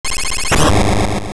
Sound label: Boss Door; A dungeon boss door opening